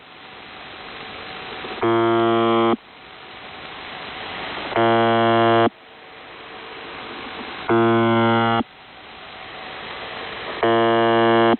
buzzer sample

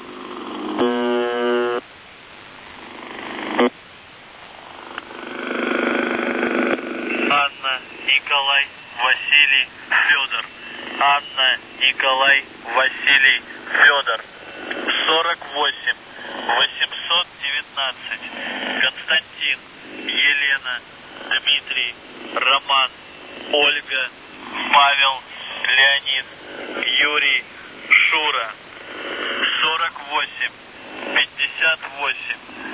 buzzer usb msg

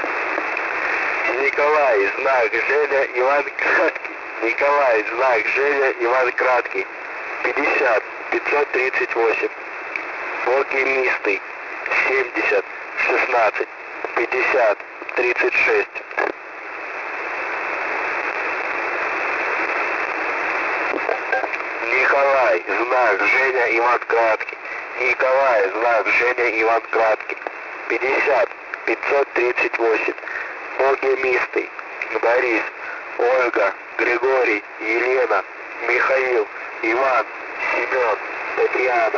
usb msg laugh; vega 5372

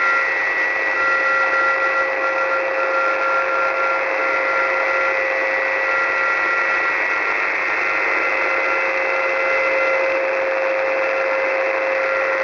usb pip cw bleed; baron 3850

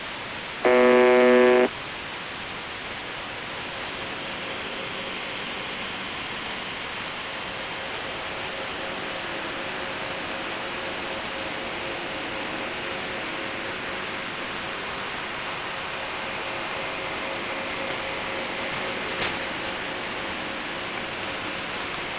buzzer usb msg

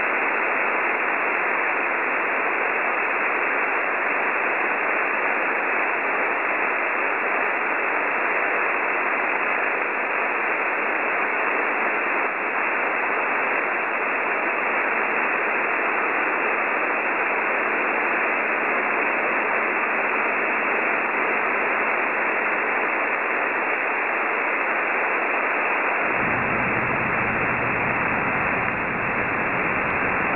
websdr recording 6140.1khz

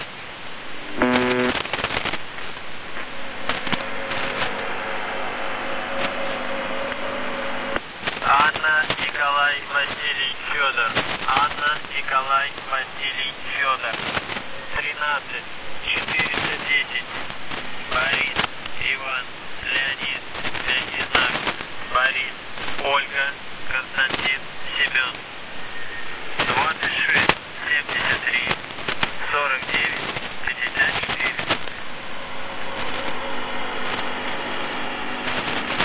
buzzer usb msg

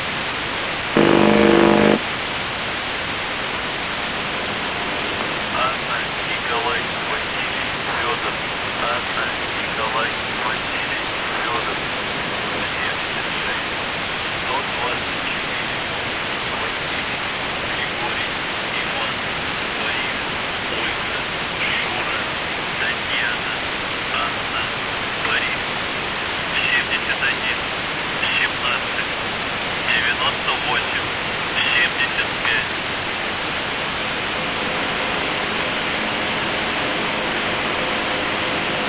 buzzer usb msg

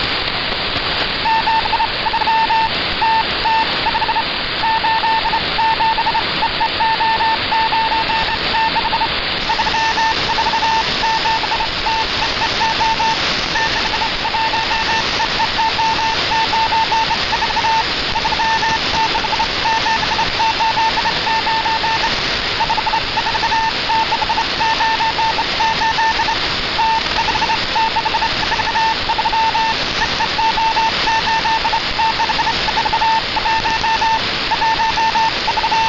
morse counterpart, North Korean station; v28; 5420.7 am; rycat; Recording of Morse counterpart transmission

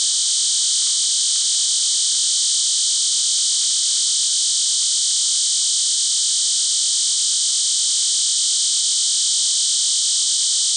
ofdm 6khz; perelivt

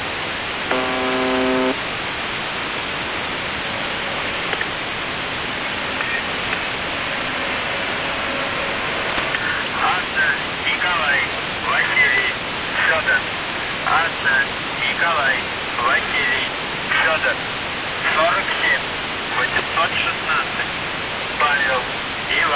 buzzer usb msg

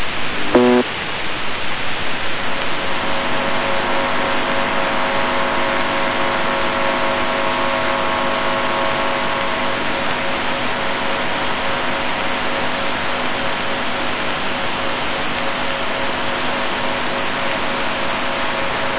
buzzer usb msg

